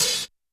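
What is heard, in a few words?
Hi-Hat Open Break; YAMAHA RM1X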